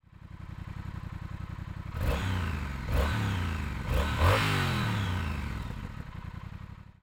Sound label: Speed Twin Closed, TRIUMPH